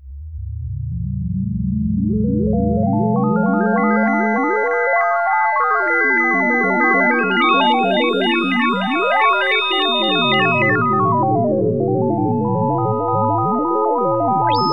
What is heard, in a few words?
CHIMES; AKAI Anime Sound FX; ATMOS